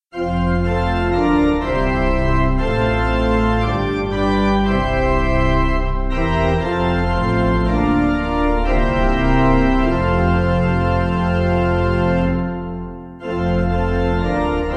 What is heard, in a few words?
Pipe